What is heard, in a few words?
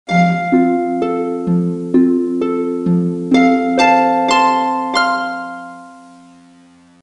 JINGLE